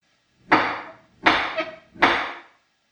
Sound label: Knocking On Haunted Door With Creaky Knocker; Creepy Door; Andrew Fleming